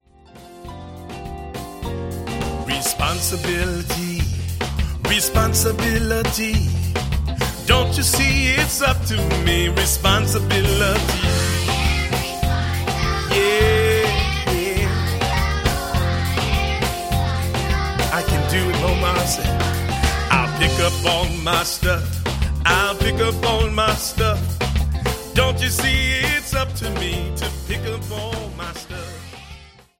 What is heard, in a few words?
Vocals No Dialog